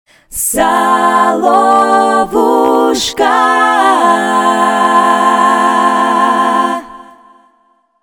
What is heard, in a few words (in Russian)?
аудиологотип (джингл) соловушка